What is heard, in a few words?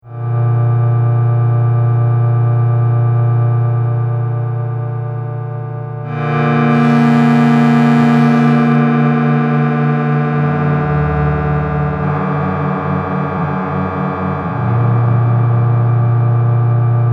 drum outh